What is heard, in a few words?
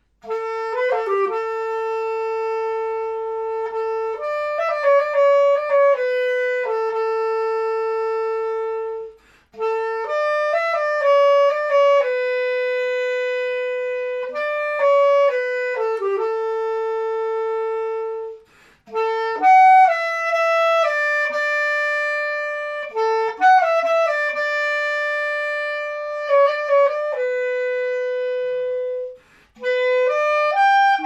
Oboe